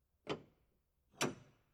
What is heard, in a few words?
Old UK Church Door Opening Big Latch Variations Large Reverb; Tom Hutchings